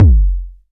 short reverb kick single hit F sharp key, steel kick drum sound